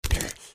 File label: trog foot run, street trog